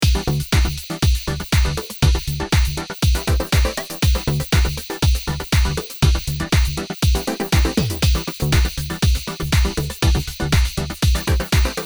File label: loop funky